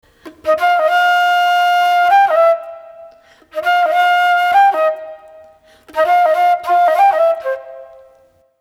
100 G Flute

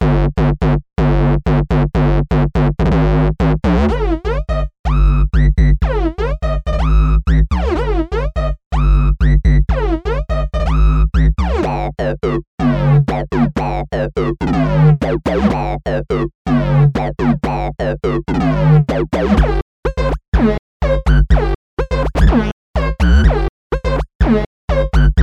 Synth Bass

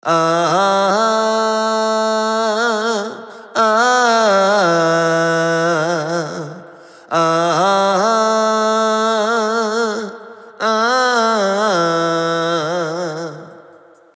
136 Am Vocal Harm Fx

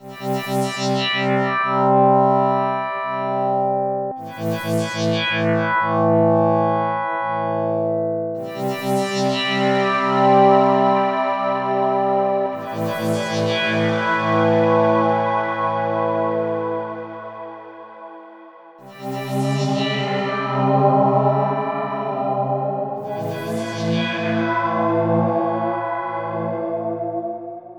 Poly Synth; Emission

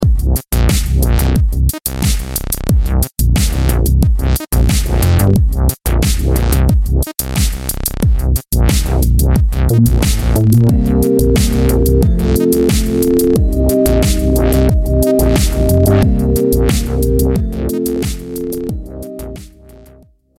Bulky Octave Funk

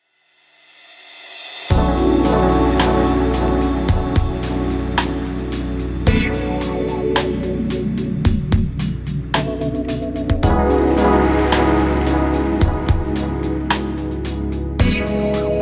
Khords

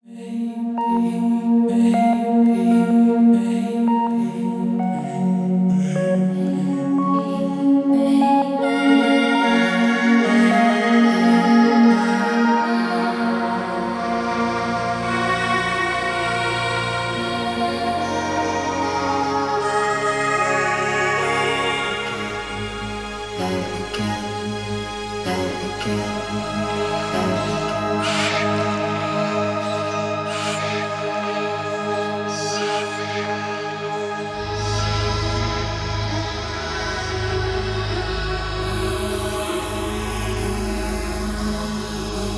Mantra Pads; Zampler

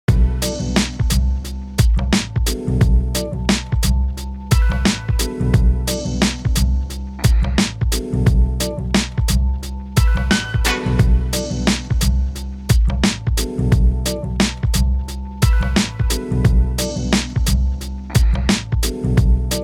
Coffee Breaks

